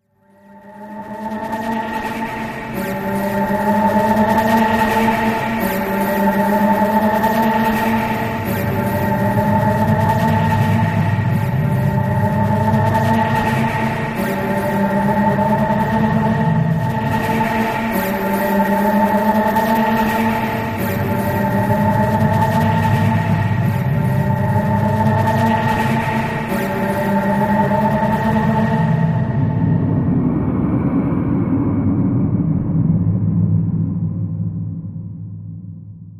Dist Race Distorting Am, sci-fi ambience

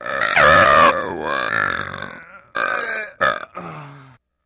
Belch Lng Obnoxiou

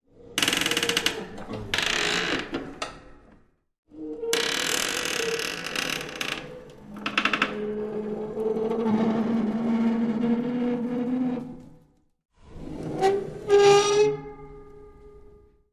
Trap-Door, Heavy Creak; hinges & creaks

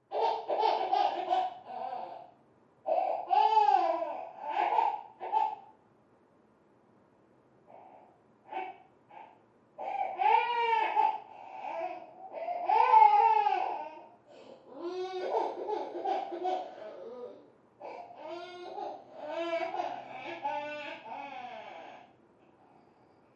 Baby; Crying, From Next Room.

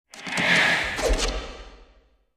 Irregular Air Whoosh, torch

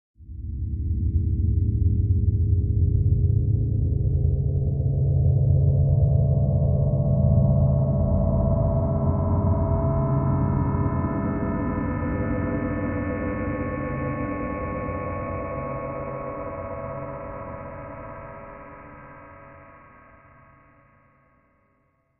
Bed Electric Space Sw

